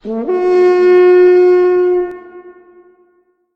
Gjallarhorn; Vikings

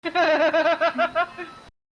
laugh

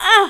hurt grunt

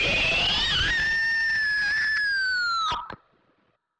appear scream

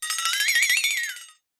wobble sfx

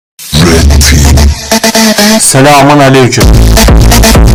remix bass boosted

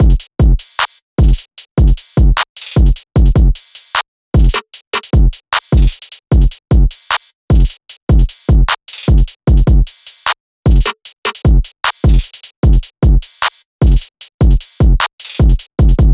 full drum beat; Rage Match

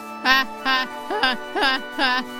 teen hahaha

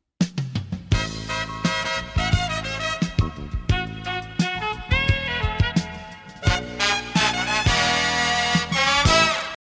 sax gameshow intro

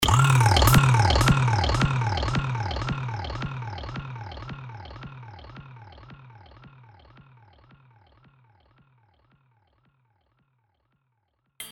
yau echo

